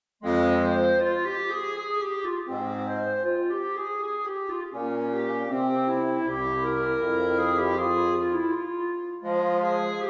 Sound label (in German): Andante Cantabile Telemann Fagot Sonate; Clarinet